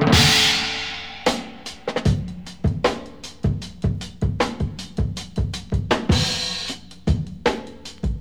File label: Bonus Beat